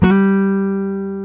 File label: Jazz Guitar; 90's Sample CDs; Club-50 - Foundations Roland; Jaz-Kessel